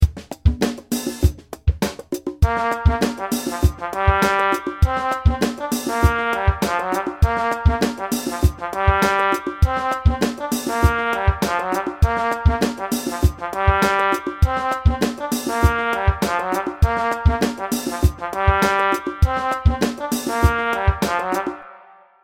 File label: style riff, trombone